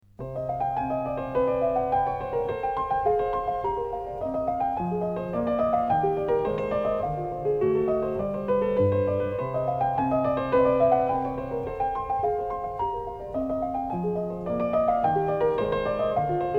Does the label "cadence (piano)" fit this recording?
Yes